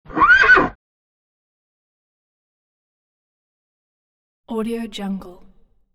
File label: a horse whines and whimpers